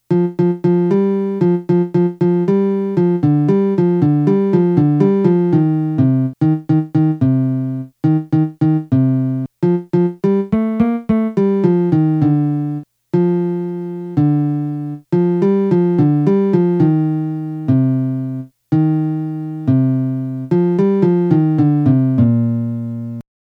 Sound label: melody